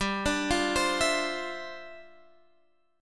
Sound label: G 5th 13th G, chord